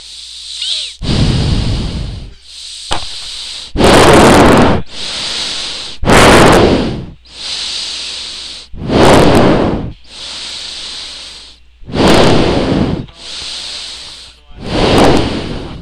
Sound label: breathing